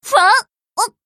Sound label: clock